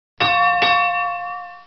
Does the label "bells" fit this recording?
Yes